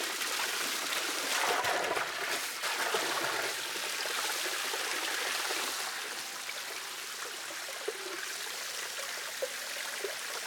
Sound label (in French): BRUIT Ruisseau